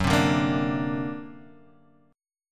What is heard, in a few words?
D Augmented 7th Gb, guitar